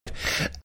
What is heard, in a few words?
making death rattle sound